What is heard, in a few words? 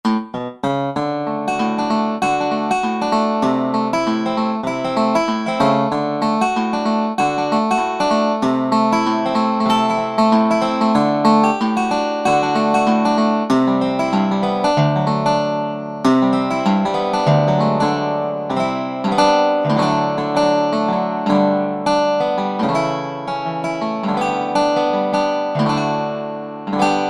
Reso. Guitar, Roland SR-JV80-17 Country Collection